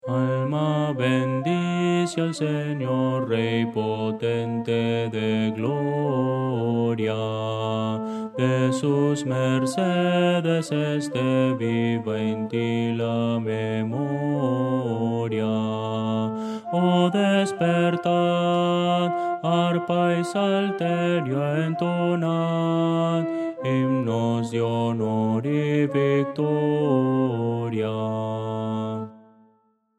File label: contralto